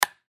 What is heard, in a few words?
Ping Pong Paddle, Ping Pong Paddle Hit Ball Hard; Ping Pong (Sound Effects:Sports); Blastwave